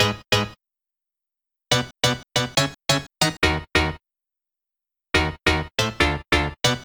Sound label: Boss Chords